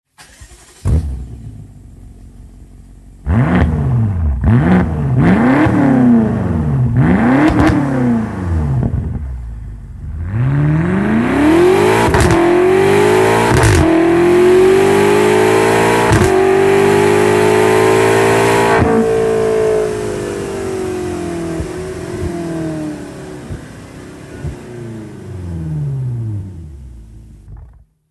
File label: BMW X5M